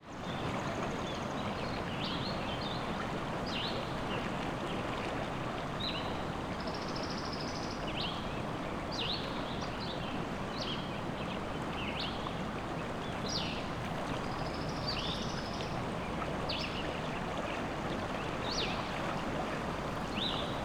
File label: forest ambience